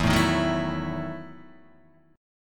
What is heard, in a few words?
E Major 7th Suspended 2nd Suspended 4th E, Guitar, Open D; chord shape